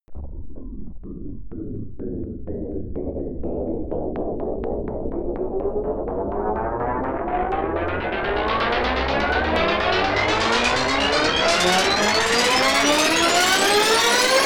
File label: tape machine noise spin up, Risers; whooshes rises